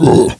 Bloat Attack; Bloat Santa

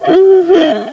Stalker Attack

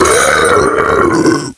Deer Death

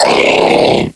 Pain; KF Christmas; Patriarch

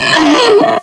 Stalker Death

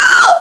Siren Punch; Carol

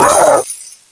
Deer Pain

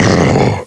Jack Pain; Jack Frost

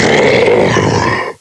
Jack Death; Jack Frost

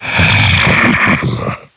Scrake Attack

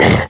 Jump; KF Christmas; Jack Frost

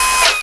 Spawn Out; TheDanes UT Projects; DanesSniperModMedia

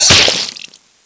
Bloat Hit Player; Bloat Santa

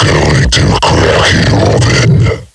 Pound Roam

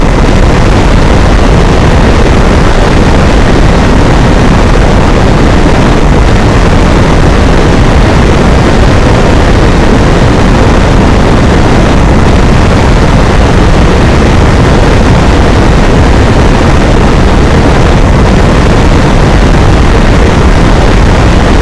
Loop

